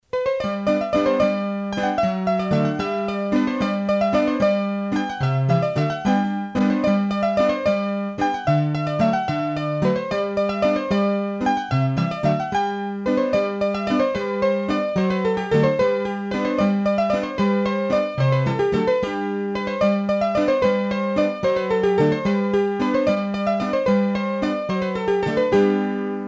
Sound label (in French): Bourree, hurdy gurdy; Bourrees a 3 Temp